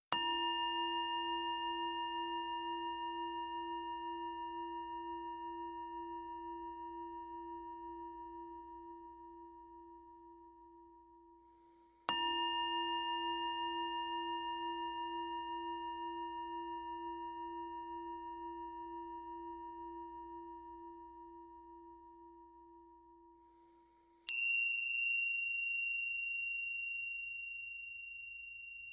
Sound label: Tibetan Bowls & Bells